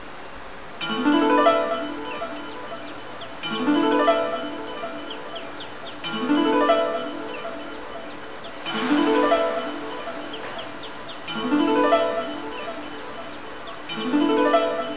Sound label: 4 melody, station; mito; joban; jreast